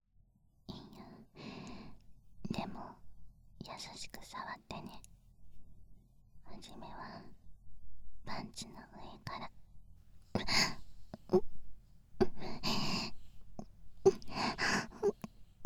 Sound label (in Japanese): パンツ越しに愛撫…・ねっちょり耳舐め…